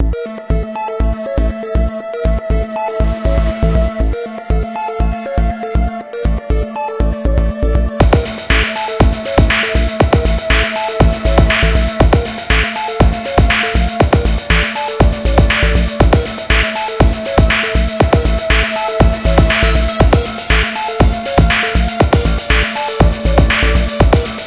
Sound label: Dark Future Music Future World Dark Loop